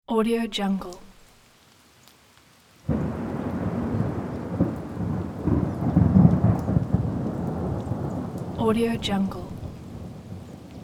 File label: thunder close; alexleadsounds